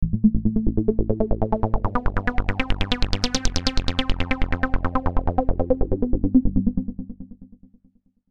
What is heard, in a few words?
Pentagon Arpeggio